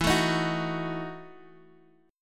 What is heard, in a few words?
E Major 7th Suspended 2nd Suspended 4th E, Guitar, Half Step Down